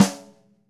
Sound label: Ludwig Supraphonic Snare, One-Shots; Sonic Foundry (Sony Creative Software) - Vital Drums